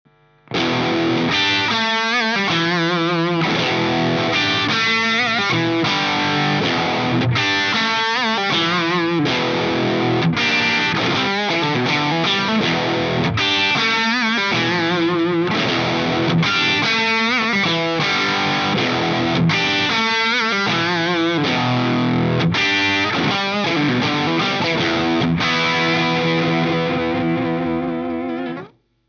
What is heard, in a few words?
FUZZ